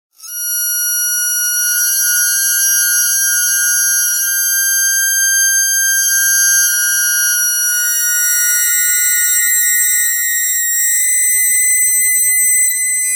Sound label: bagpipe thread noise